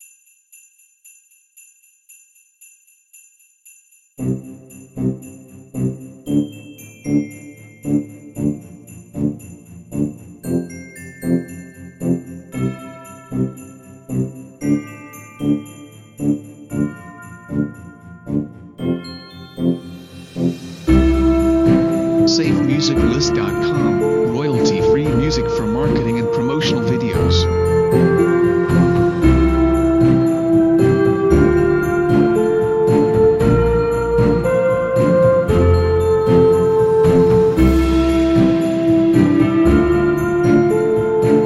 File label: Epic Christmas